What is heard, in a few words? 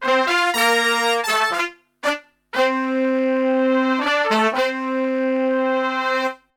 POP BB-C, Brass; Best Service; Brass Super Section; POP LICK